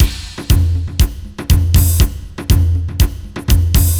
Rhythm; Samba de Amigo; Dreamcast

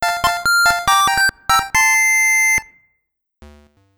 Time Over; Ultra

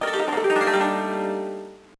Jingle; gba; Revenge of Shinobi

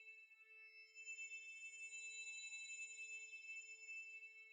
shiny item loop

